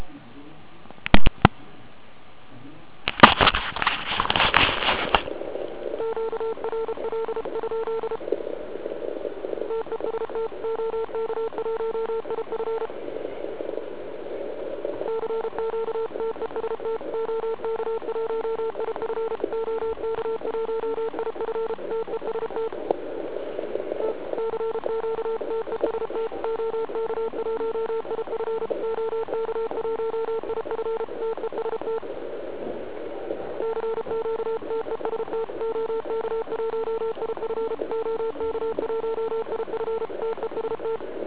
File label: CW RUN